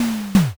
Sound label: door close